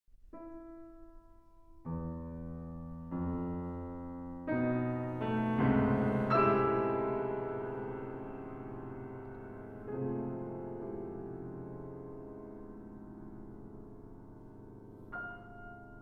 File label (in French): Musique pour vents, Elegie; Hetu